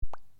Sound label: Waterdrop